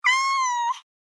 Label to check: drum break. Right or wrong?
Wrong